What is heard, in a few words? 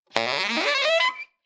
A crazy saxophone riff.; Horn Sound Effects; Musical